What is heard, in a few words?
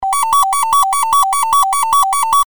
warning signal